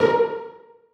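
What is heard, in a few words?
celli pizzicato a2; Sonatina Symphonic Orchestra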